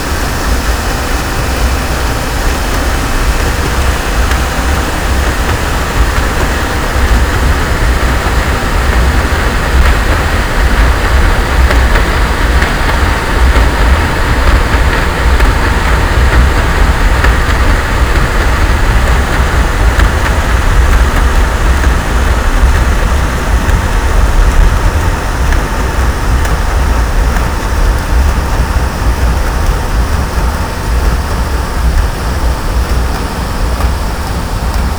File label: Noise